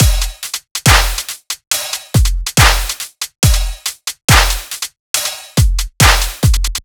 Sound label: Drum Full 140BPM, dubstep; Ghosthack; AC2024 - Day 13 - Dubstep Sounds 2025